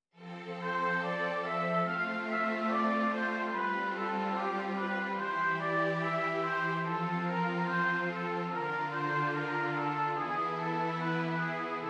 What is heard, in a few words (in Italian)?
esercizi di contrappunto semplice A quattro voci